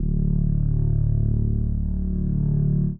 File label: Reese D